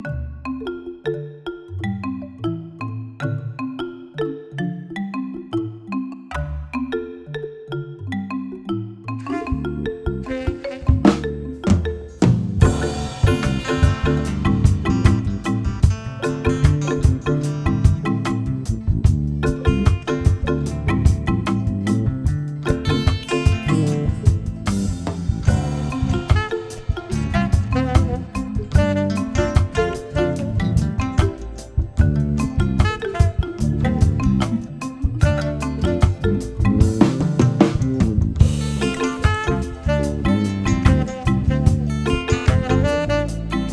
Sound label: marimba raining